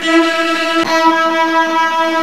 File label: ENSONIQ TREMOLO STRINGS